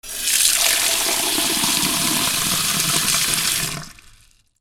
KITCHEN

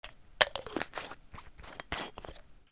BATHROOM

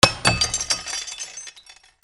FALLING

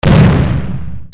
WEAPONS

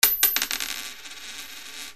MONEY

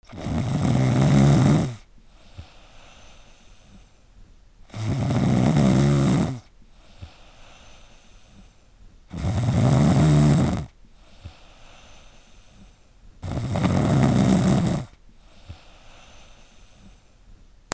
PEOPLE

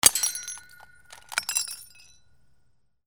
BREAKING

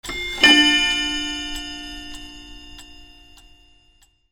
BELLS

